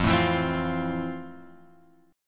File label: F Major 7th F; FM7 Chord {1 0 2 X 1 1} strummed